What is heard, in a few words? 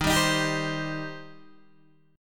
D sharp 6th, D sharp, down, Guitar, Open D